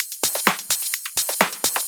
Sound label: Electro Loop 128 BPM; Vengeance Samples